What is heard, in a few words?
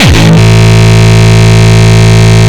Longkick, kicks